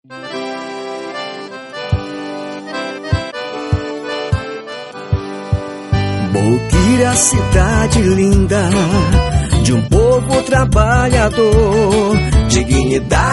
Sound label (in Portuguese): Jingle politico